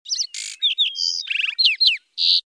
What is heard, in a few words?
bird